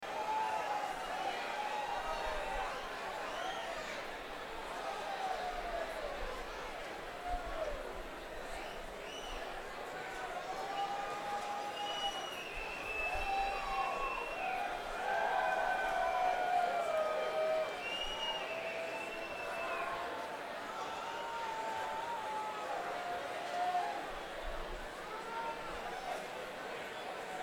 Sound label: Applause